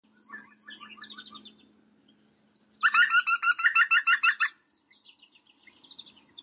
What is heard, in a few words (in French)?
Pic vert